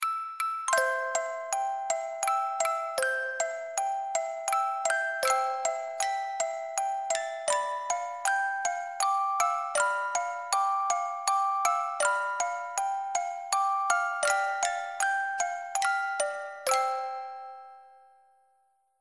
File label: ringtone